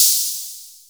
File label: big open high hat f sharp key; Duration 0:01; Samplerate 44100 Hz; Bitdepth 24